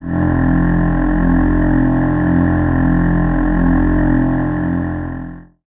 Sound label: choir F; Retro Sampler